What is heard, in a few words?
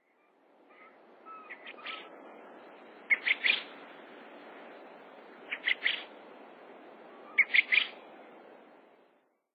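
Japanese quail, watarase; ウズラ; 栃木県渡良瀬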